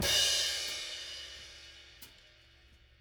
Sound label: Crash Room, Cymbal; One Shots; Drums; Ableton Live 12 Lite; Core Library